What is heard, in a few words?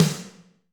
Snr Ambient, Alesis D4